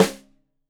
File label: Rim Shot Stereo, drums